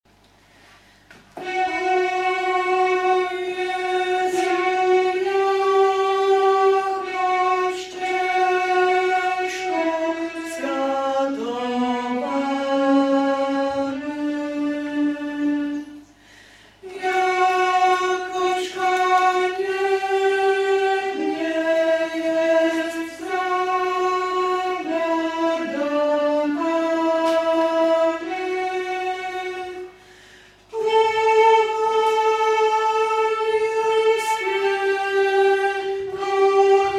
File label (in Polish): lament śmierci